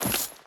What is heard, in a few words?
Water Chain Land, Footsteps; Free Fantasy SFX Pack By TomMusic